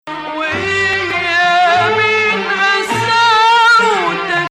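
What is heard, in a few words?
Ajam, Arabic music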